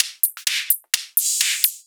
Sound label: Hihat Loop 128 BPM